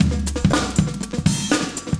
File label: chique 120, loops, 120bpm; Sound Effect Library